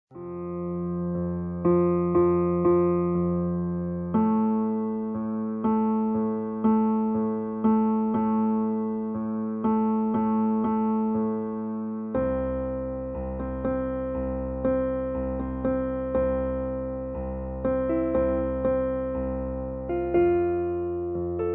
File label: Piano